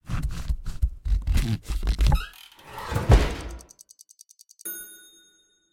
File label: crafting dessert